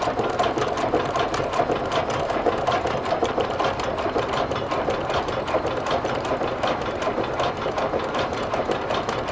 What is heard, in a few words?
gear; GrandFantasia